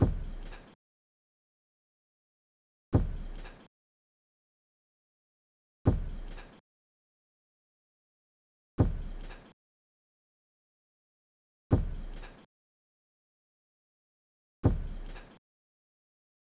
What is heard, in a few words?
Knock Drum Loop - 82 BPM Accent; Drum Loops; Loop Stems; Hallway; Cymatics; Mystery Pack Vol 4